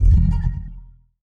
Multimedia Internet CD-Rom Flash Beep Low Pitch

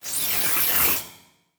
Transition Electrical Warble; Adobe; Production Element

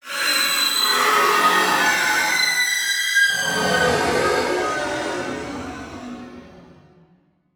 Title Transition Evolver; Production Element Title Transition

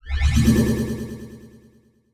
Multimedia Internet CD-Rom Flash Beep Multiple

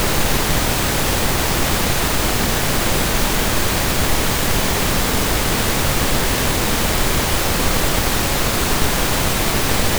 pink noise mono

